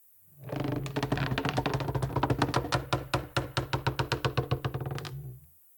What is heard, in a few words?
Exterior Wood Creak; The Town; 3D Environment